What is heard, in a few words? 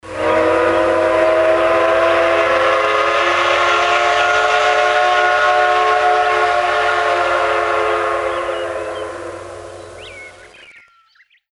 D51 long